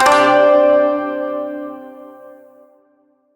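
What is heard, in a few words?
Shawzin Pentatonic Major Chord L; Chords; Warframe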